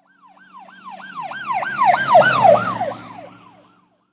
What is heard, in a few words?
police; Blind Drive